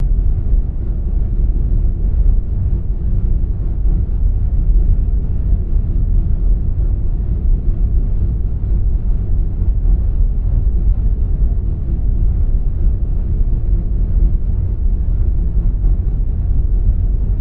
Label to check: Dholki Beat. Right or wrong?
Wrong